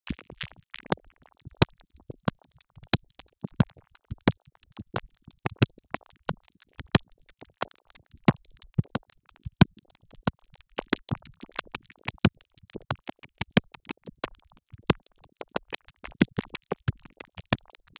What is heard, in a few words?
Bad Noise